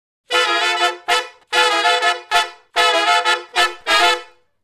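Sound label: Funk Riff (G) harmony, Horncraft for R&B Sections; Sonic Foundry (Sony Creative Software); Crimson Blue and Fabulous Horncraft 4 RnB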